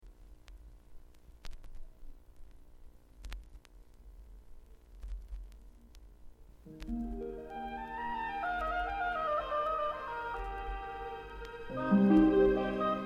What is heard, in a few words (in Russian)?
solovei vokaliz